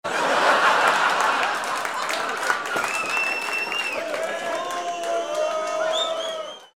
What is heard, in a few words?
laugh applause